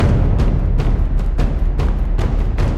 Drums Ambience; Assassin's Creed III

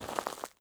FOOTSTEP Walk Trainers Compact Gravel Slow; mono; adams venture origins

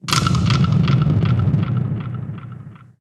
Tremor; Puzzle Chronicles; PS3; PSP; X360; SFX SPELLS

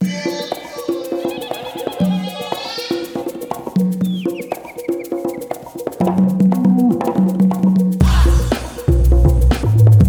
Mysterious Jungle (Waterfall); madagascar; ps2

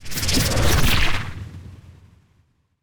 soul fly

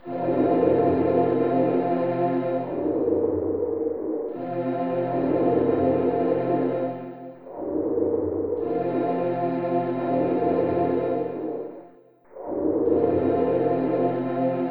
Silence; Metroid Zero Mission Remastered

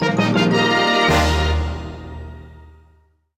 Good Fanfare; super smash bros. ultimate

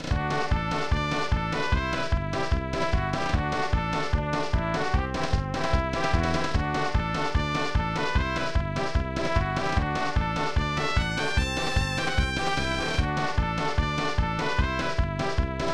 Victory, High Score; Kaizou Tyoujin Shubibinman Zero; SNES

